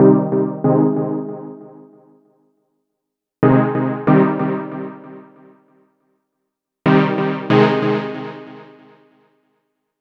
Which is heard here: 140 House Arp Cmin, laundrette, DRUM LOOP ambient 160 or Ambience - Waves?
140 House Arp Cmin